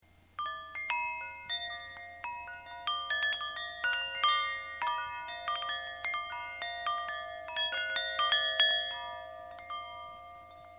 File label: chime